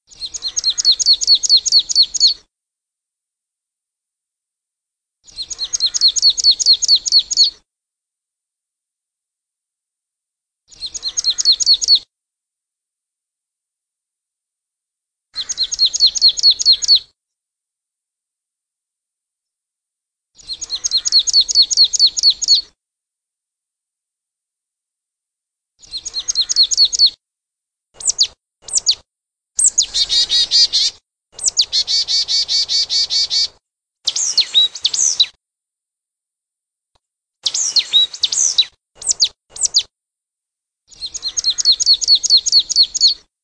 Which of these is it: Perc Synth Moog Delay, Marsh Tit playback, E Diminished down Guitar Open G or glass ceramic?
Marsh Tit playback